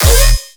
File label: bass rude Eb